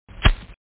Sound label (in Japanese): ライター